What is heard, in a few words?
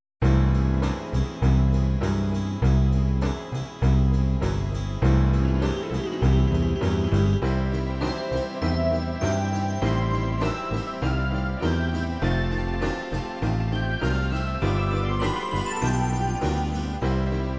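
8 beat medium, midi; 8 bit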